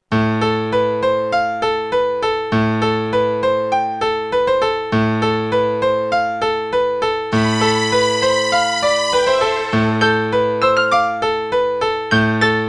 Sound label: mordor test music